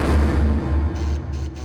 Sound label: Mus Tsunami 145 Light; From Dust; ps3; xbox 360